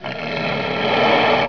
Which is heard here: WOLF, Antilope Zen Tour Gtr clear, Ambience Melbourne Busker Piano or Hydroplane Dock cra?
WOLF